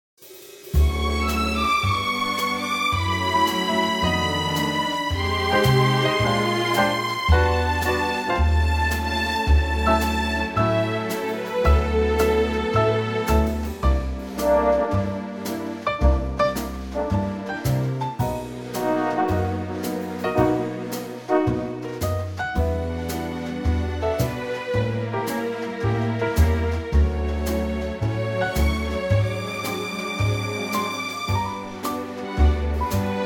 D orchestra

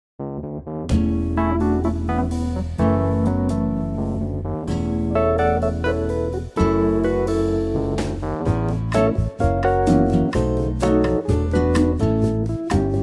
bossa A, backing tracks

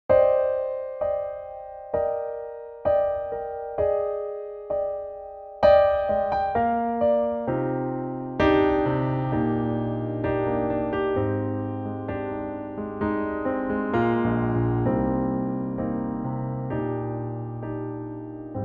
piano; key - Cm - vocal range - F to Bb; Lovely piano only arrangement

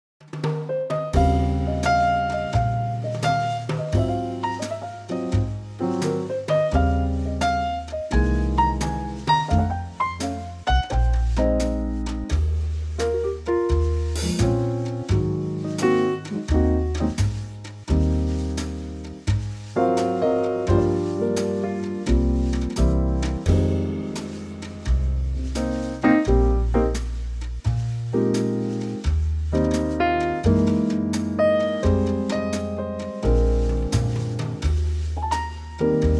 ballad Trio

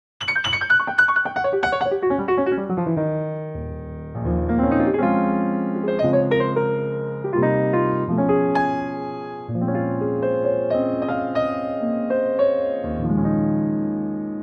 Ab Piano

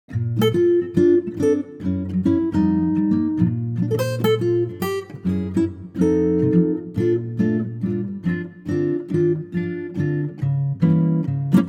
Bb acoustic guitar

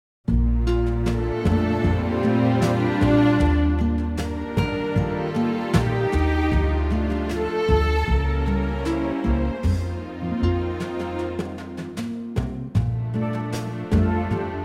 Bb orchestral

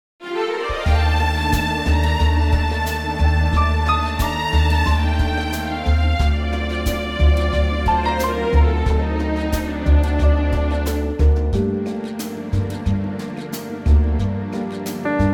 Gm orchestra

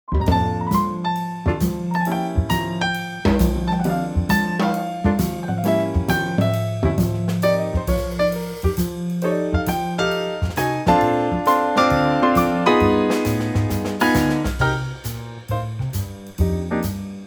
Bb Trio

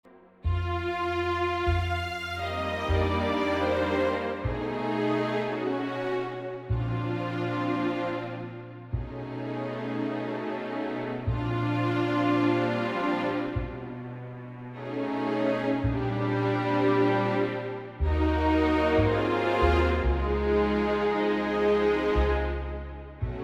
Bb orchestral

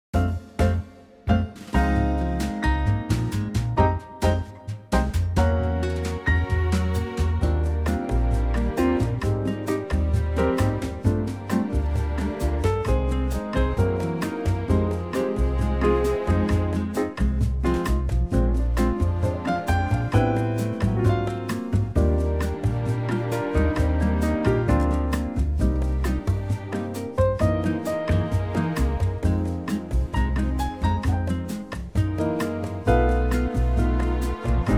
Eb, female

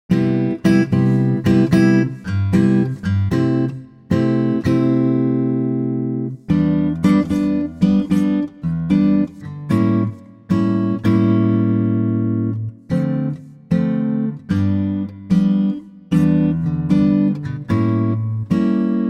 C Acoustic Guitar

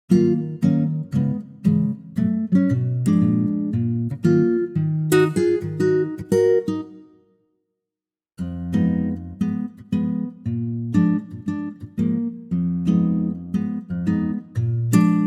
Ebm acoustic Guitar

